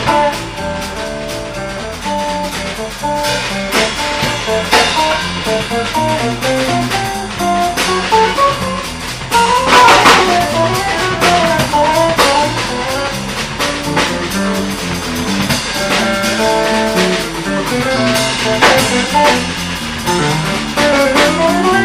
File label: 4beat, bass